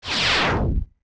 Jump; Odolwa jumping

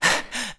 Stamina Lower; running with lower stamina; Link; Skyward Sword; zelda

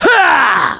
Victory; Ganondorf

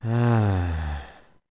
Sigh; Grog sighing

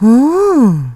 Stylish Woman Oooh

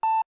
Low Health; Low health beep (loopable)

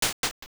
Stairs; Walking up or down stairs (entering a building)

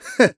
Grab; Ghirahim

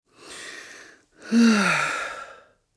Upset Sigh; Ghirahim's upset sigh and laugh when Link won't concede; Skyward Sword

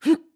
Attack; BOTW; Breath of the Wild; Link

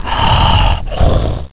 Snore; Rem (shoe maker) snoring; Zelda

